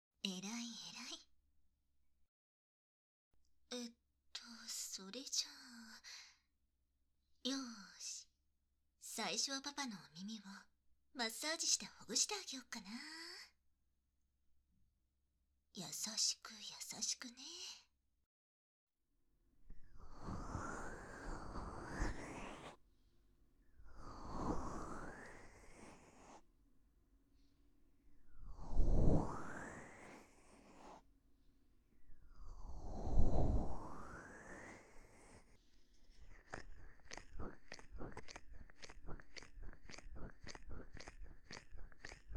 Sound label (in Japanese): ループ用音源, ASMR